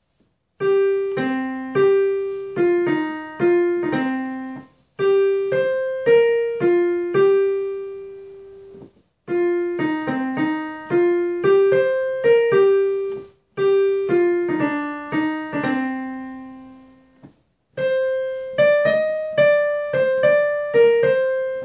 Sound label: Southern City Night Soprano, Accompaniments